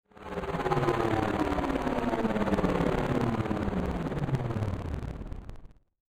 Sci-Fi Electronic Space Ship Descending; Science Fiction

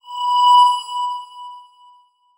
Transition Bell Swell; Adobe; Production Element

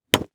Sports Golf Ball Hit

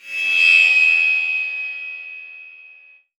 Transition Feedback Ring; Production Element

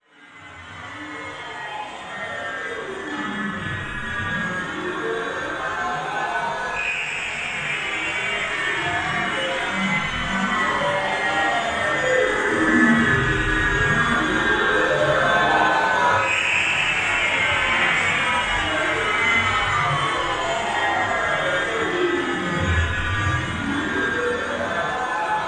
Transition Stab Dark Electrical; Production Element

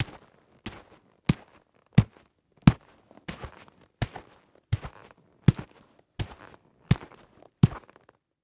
Foley Footstep Golf Cleats Dirt Debris Walking; Foley Footsteps

